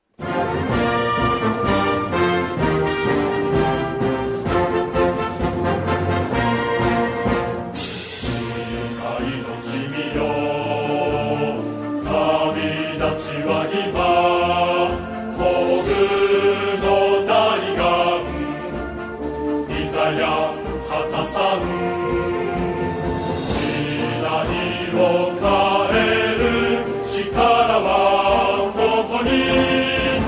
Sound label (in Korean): 맹세한 청년이여 B flat key 일본어합창; 학회가일어; 합창일어; SGI MUSIC (음악)